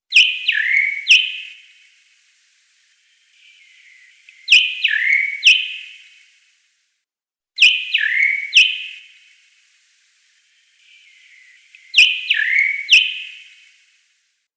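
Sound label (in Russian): Drozd Naumanna, Naumann's thrush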